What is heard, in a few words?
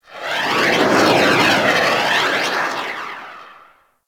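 Mortar Projectile Incoming; Warframe; Venus; Venus Terra Mortar Moa; Abilities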